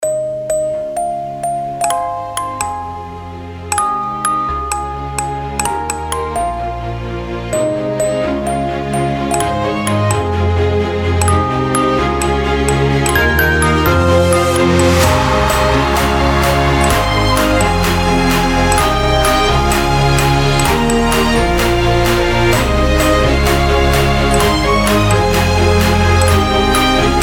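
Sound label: electronic music box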